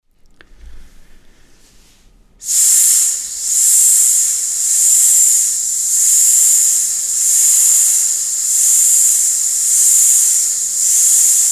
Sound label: Pulsing SS